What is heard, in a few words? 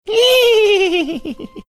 laughing whinnying like horse